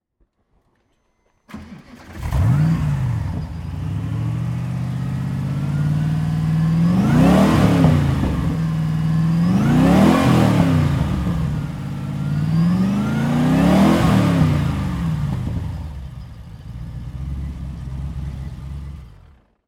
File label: Mosler MT900